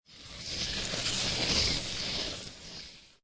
Skiing Snowboarding Downhill Slide By And Slow Down